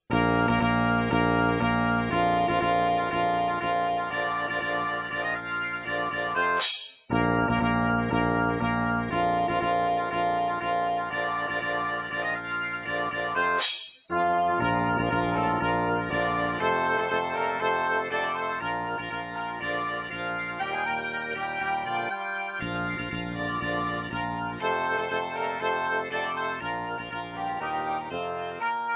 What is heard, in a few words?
tuba fanfare; brooklin